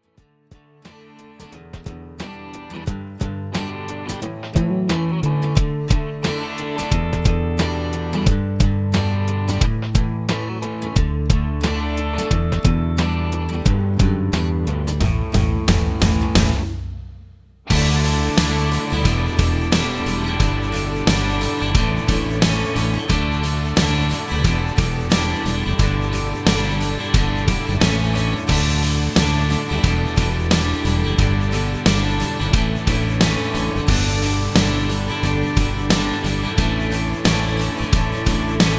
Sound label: Instrumental